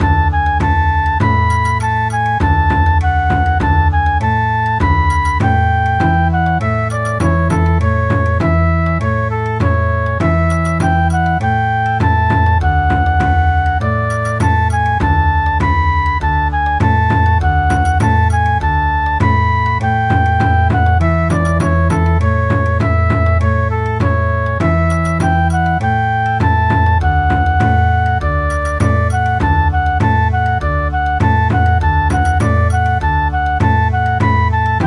Oberek Bon Odori

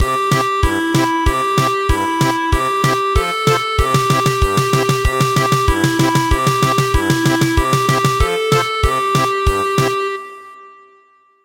German Polka

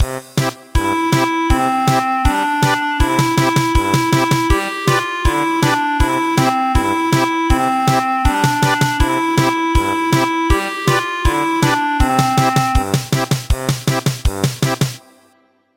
German Polka

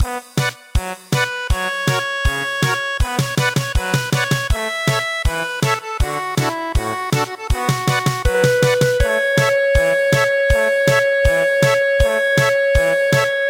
German Polka; traditional

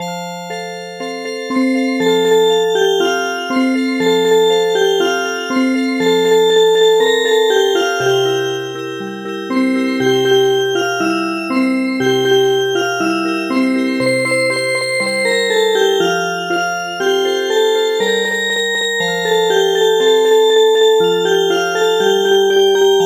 Music Box

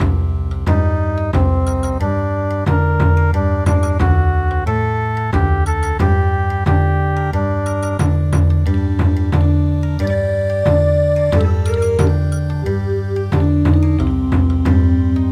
Bon Odori; Dandansoy

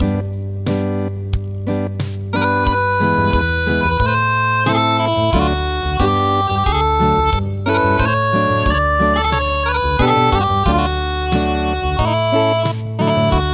Acoustic

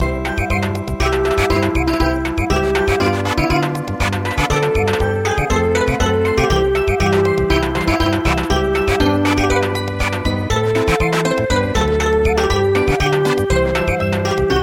Samba

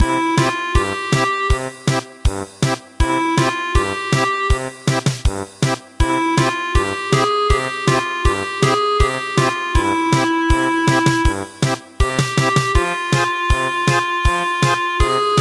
German Polka

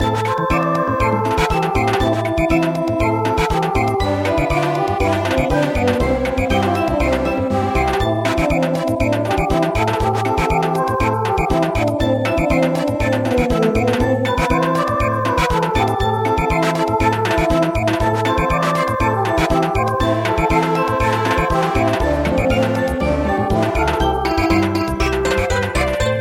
Samba; Arohnee

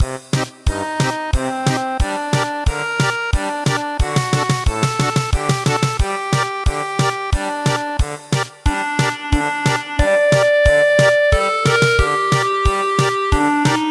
German Polka; Dandansoy

